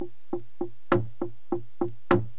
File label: indian drumloop